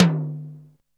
R8 Tom, Roland R-8

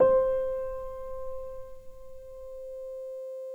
PNO PP; Synclavier Sampler Library

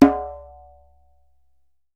DJEMBE, African Perc

